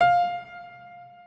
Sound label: Piano f4